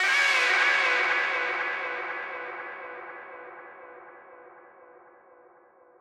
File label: trip hop guitar